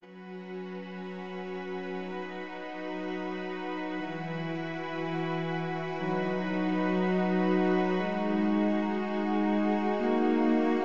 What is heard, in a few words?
Harmonical Outro